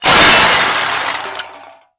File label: Junk Crash; Crashes; 1001 Sound Effects